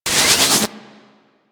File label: Powerplant Large Electricity Hit; Warframe; Corpus; Railjack; Tether Power Plant Exterior